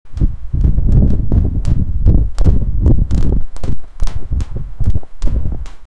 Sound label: large national flag wavin, outdoor